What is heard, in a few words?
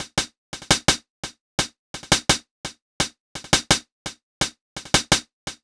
Hi-Hat, Hi-Hat Loops; KJ Sawka - Drum n Bass & Beyond; Drum Tool Box